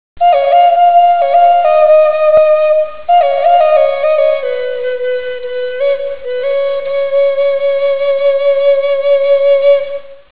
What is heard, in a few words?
chipmunk, flute